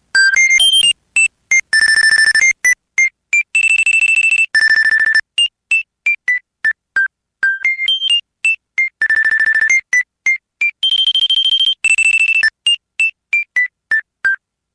ring; Angel Island

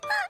gasp

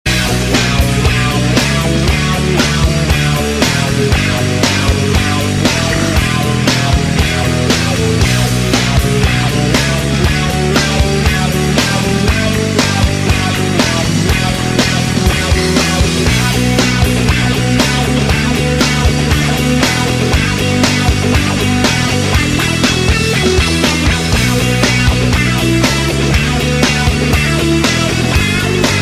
Power Guitar

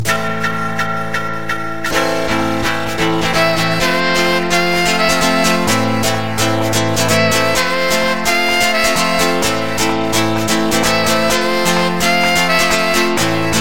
Sax

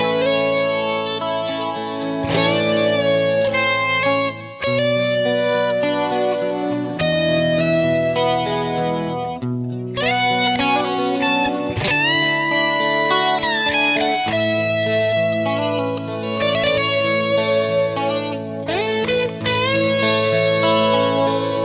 Guitar Christmas

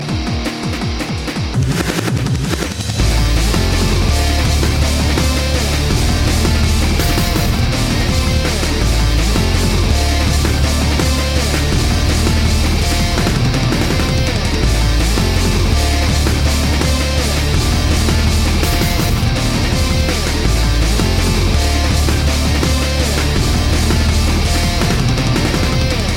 Electro Rock

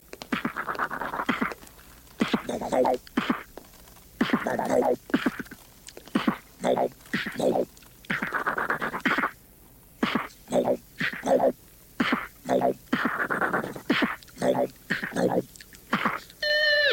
Iphone 4s Electronic

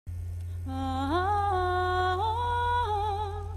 Singing

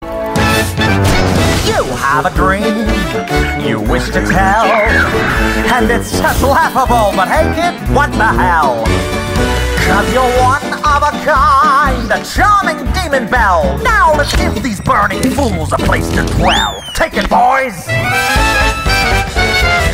Singing; Alastor

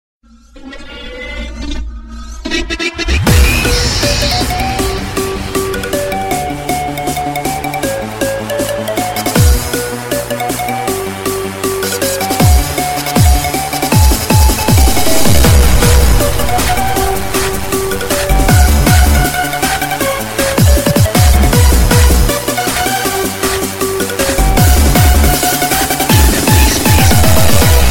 Techno Bass Tone

